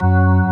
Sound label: B3 FULL; E-MU Formula 4000 Series Vol. 5; Protozoa; Vintage Presets